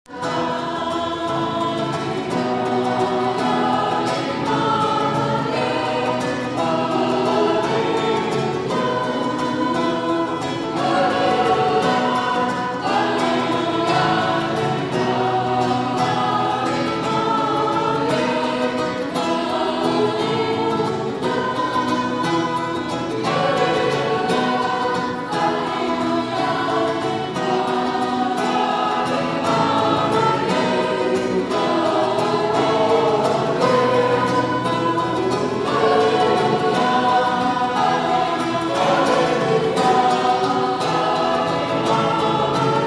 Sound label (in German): Halleluja